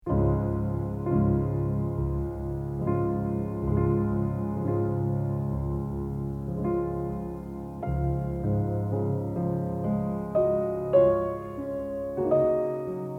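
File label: Deep River, piano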